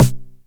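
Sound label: 909 SD Tape, snare drum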